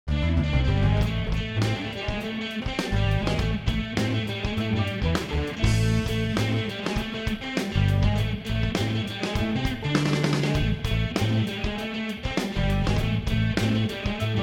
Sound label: Rock, music